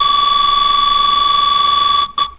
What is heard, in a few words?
Bonus Points; Tallying points from a Bonus Round (same sound used in "Original Sound" mode); zelda